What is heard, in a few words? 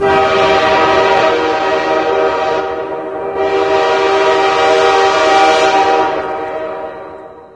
train horn, alarms; ambient; source engine; hl2